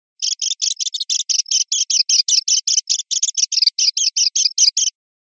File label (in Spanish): rep cernicaleos, pardillo